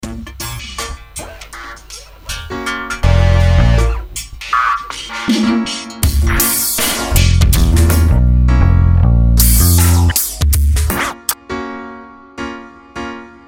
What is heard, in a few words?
Loop 080